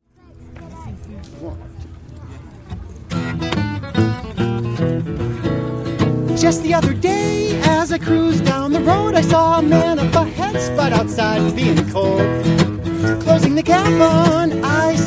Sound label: blues; idaho gathering live